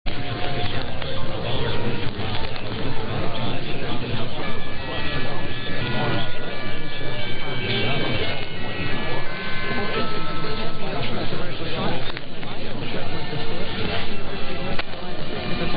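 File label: Radio Bayamo chimes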